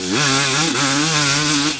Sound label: Mid, Engine